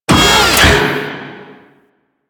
Shipyards Robot Arm Short Move; Ambience; Props; Warframe; Grineer Shipyards

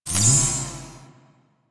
Amplify Shutdown; Warframe; Veilbreaker; Devourer Kahl

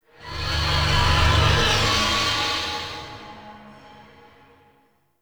Exterior Jealous Sky Screech; Warframe; Ambience; Duviri

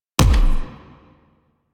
Quick Draw Punch; Warframe; The New War; Quests; Weapons; Omicrus

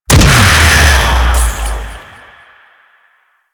Death Dash; Warframe; Teralyst; Vomvalyst

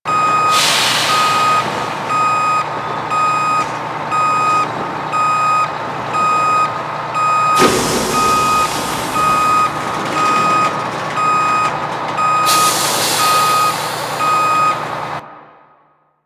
Bus Idle Loop; Ambience; Props; Warframe; NineteenNinetyNine

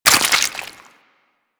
Proc Bleeding; Mods

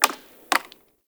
Tree Pine Branch Break; Ambience; Trees

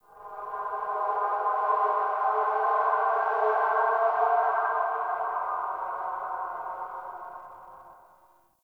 Spirit Cry, Crypt Ambience; One Offs; Champion Crypt

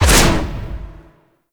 Melee Heavy Attack Short Whoosh; Warframe; Weapons; Whooshes

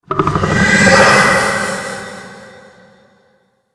Golem Gun Warning; Warframe; Attacks

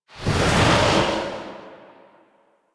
Silence End; Warframe; Warframes; Decree

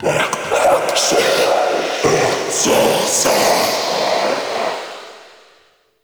Male Vocal Enemy Spotted; Characters; Vocals; Mockeries

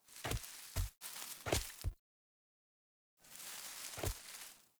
Foley Step; Garren Heal Part Three; Garren Boss Arena

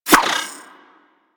Pet Skip Rope Whoosh; Warframe; Moa; Tenacious

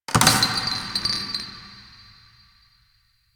Subway Garbage Amb Misc; Warframe; NineteenNinetyNine

